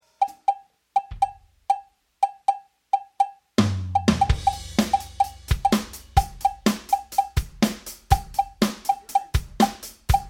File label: Strings